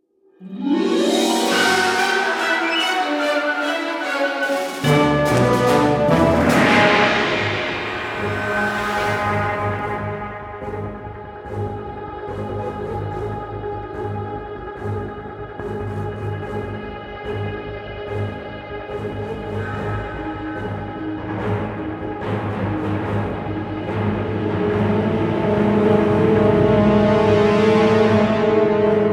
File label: Combat Dogfight; Elite Dangerous